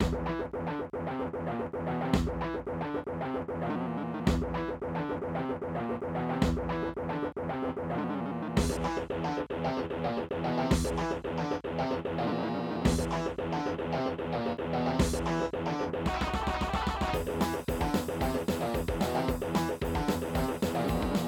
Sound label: aztech sg waverider32plus; AZTech SoundGalaxy Waverider 32 Plus; soundcard test; Doom